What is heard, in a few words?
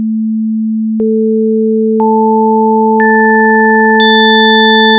octaves